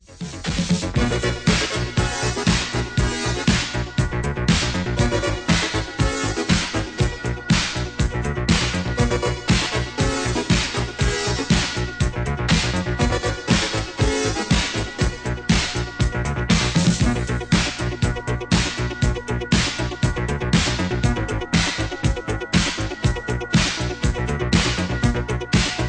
Instrumental

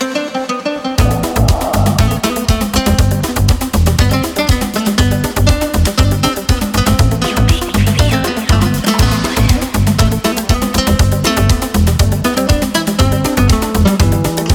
GUITAR VERSION MIX

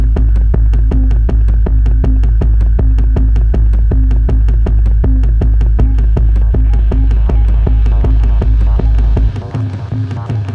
Piano

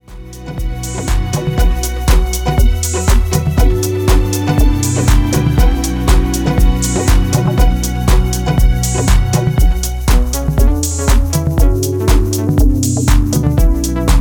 Piano Piano

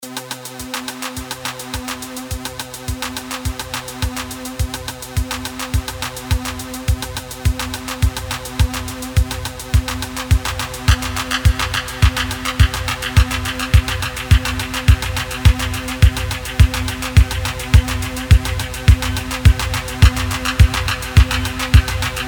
Mambo Acid Psychedelic Mix

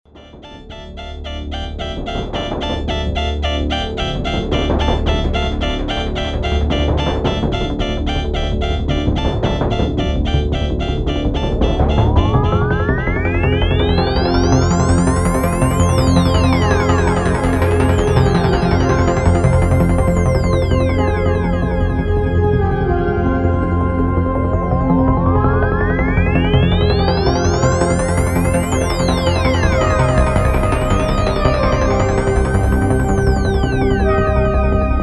Electrical Oscillator

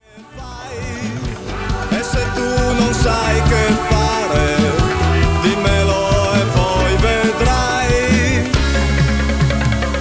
drums records

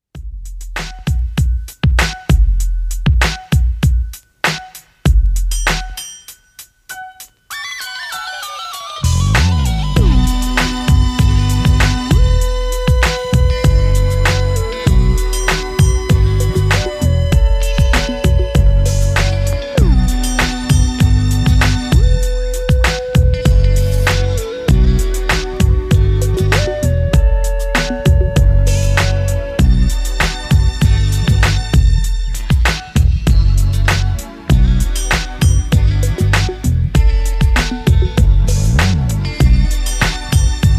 Running Game Instrumental

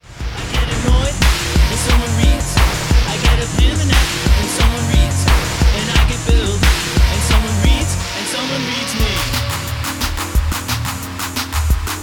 Percussion Remix

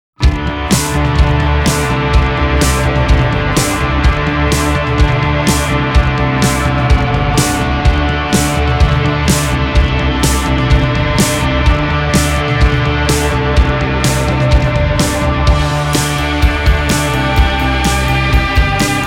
Horror Music

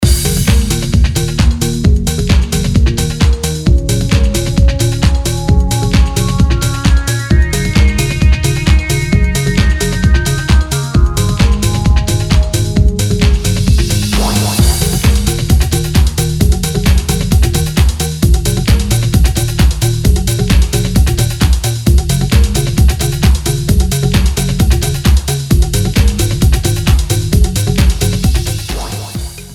dub

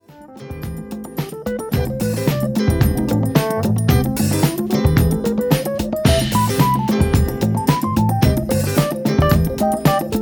drums

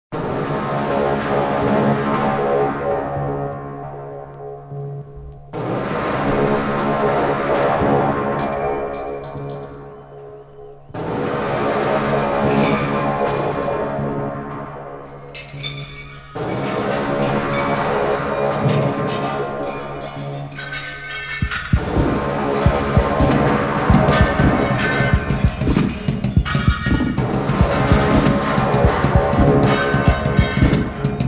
Heavy Metal

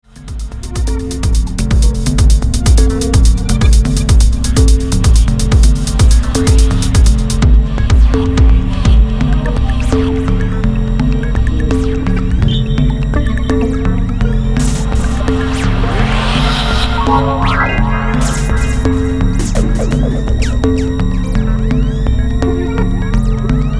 Dub

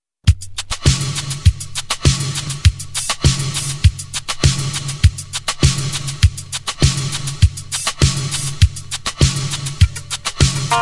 Percussion Version

